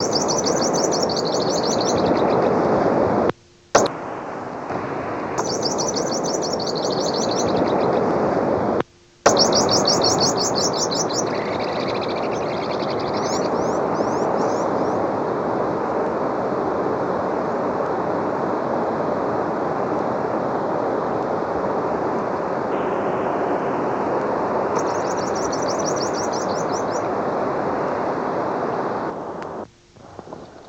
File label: rufous eared brush finch